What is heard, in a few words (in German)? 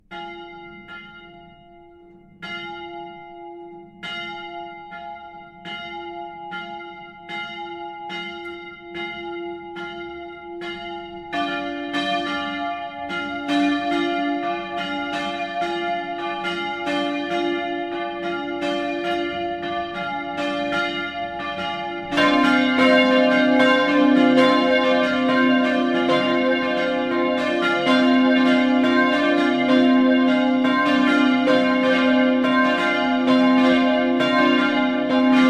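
Tonaufnahme c2 d2 f2, mehrstimmige Geläute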